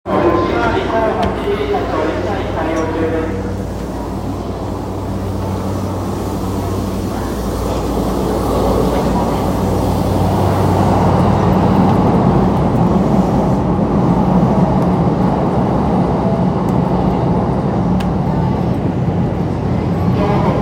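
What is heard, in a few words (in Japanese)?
JR山手線恵比寿 counterclockwise, train sounds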